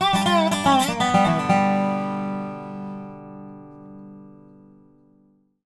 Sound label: DOB LOOPS 120; Country